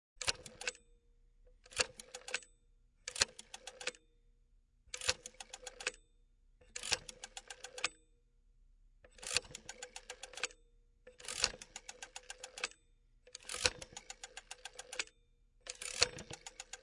Phone Rotary Dial, 1940's Rotary Phone Dials all numbers; Telephones; Electronics